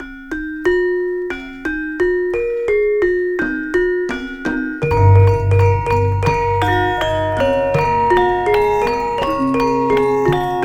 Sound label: GMLN, Gamelan Orch; Roland - LA Composer vol. 1